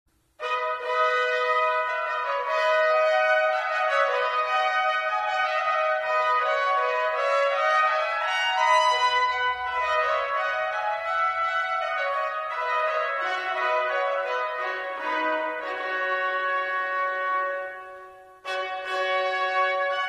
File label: two duets trumpet; biber